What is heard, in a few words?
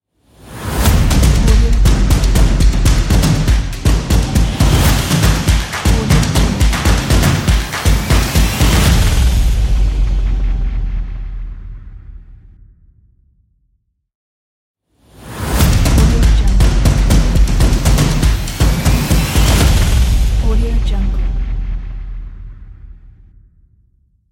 drums logo